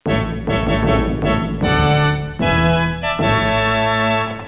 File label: fanfare